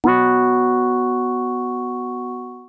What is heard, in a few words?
SYNTH